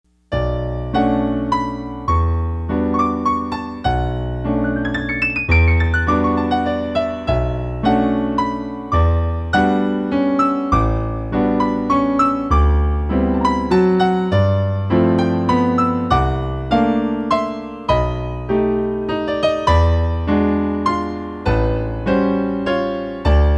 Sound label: B Minor Waltz